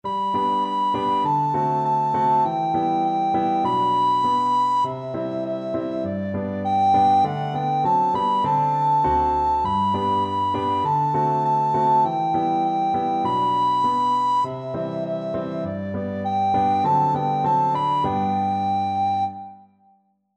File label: old st nic, recorder